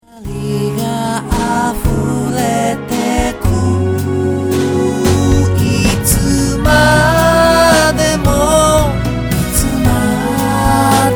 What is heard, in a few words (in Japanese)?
バラード